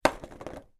food stackable potato chip tube put down on table wobble; pringles